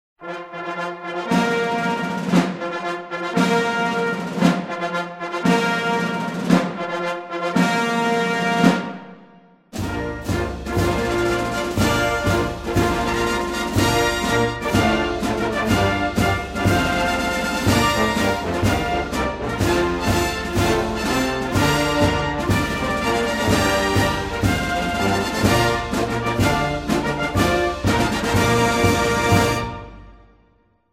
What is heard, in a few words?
Ruffles and Flourishes General's March 3 star; Ceremony Music